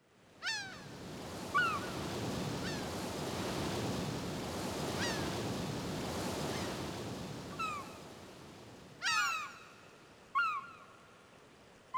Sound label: Ambience Fishing; AR Games